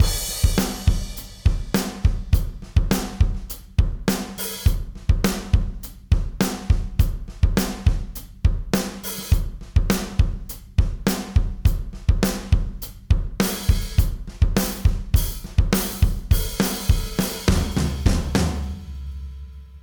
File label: tegeler audio manufaktu vtrc drums input eq